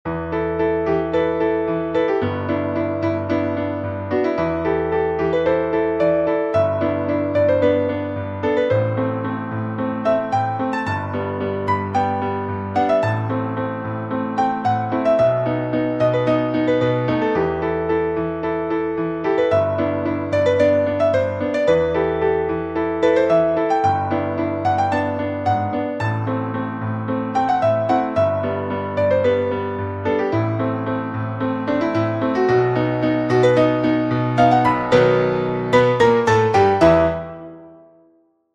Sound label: Piano Arrangement; Team Fortress 2 Soundtrack